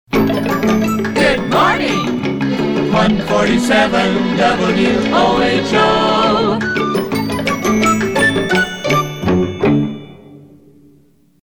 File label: Pizz. Strings Short; PAMS; Short Sig